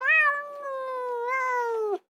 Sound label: cat response